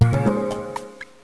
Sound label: Clockwork Open